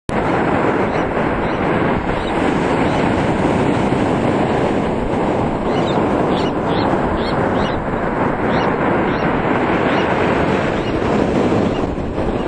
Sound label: ocean surf, nature